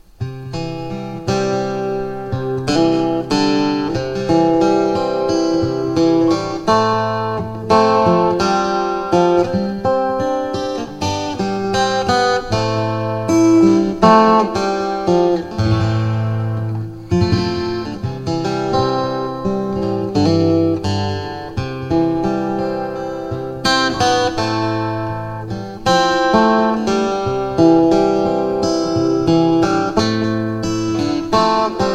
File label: Lamb of God guitar